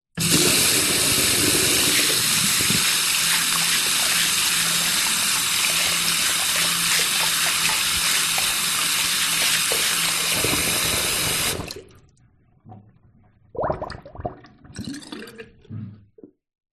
household tap short burst into bathroom basin no plug drain gargle